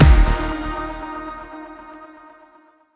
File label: Race Stop; Summer Catchers